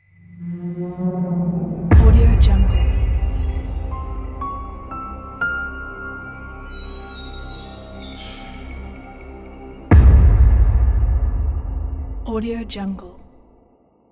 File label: dark horror piano logo